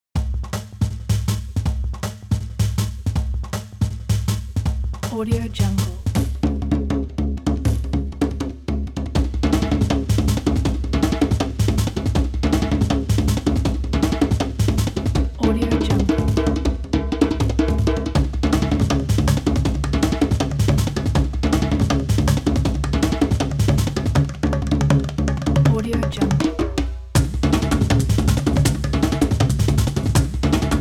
african trance percussion

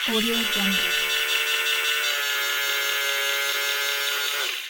small servo motor

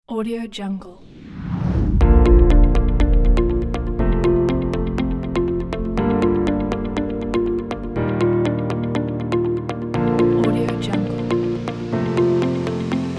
new technology advertising

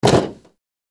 body fall on metal

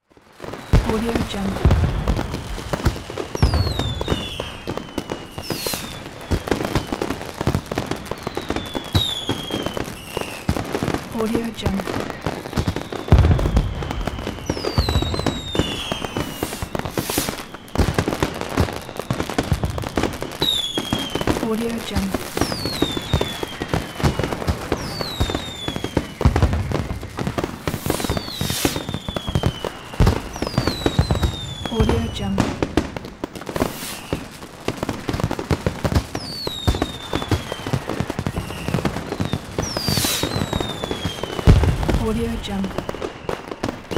fireworks

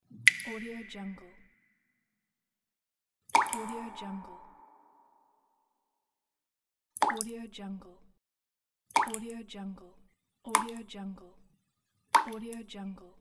water drop

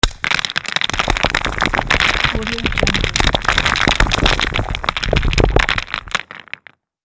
rock and stone avalanche

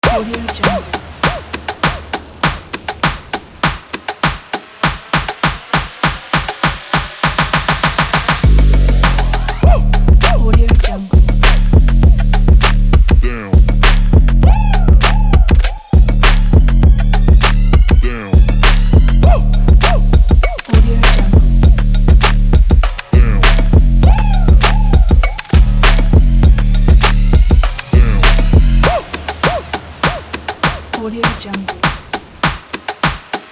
for rap

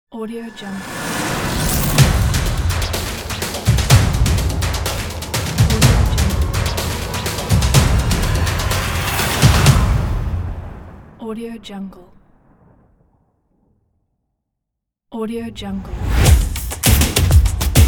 energetic sport logo pack

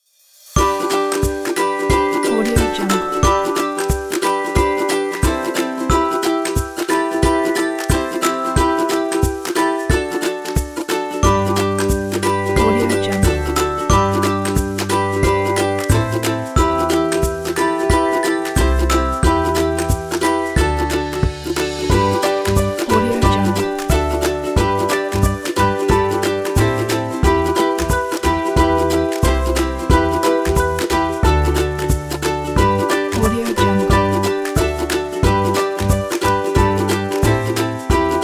playful kids

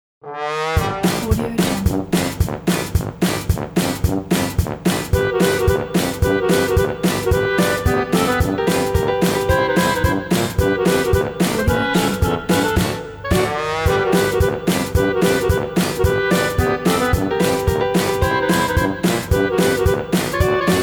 dixie jingle bells

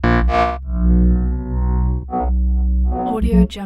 synth sawtooth; pooper; soundmorph

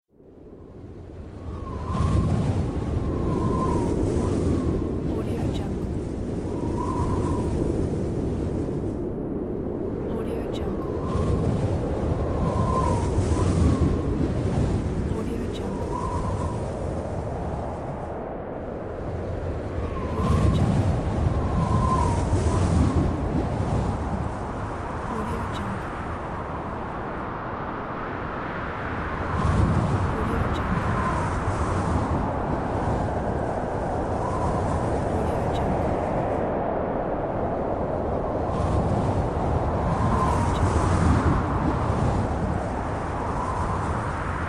wind

